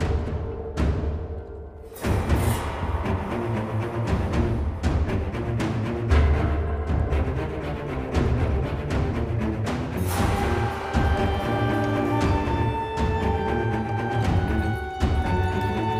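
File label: music fight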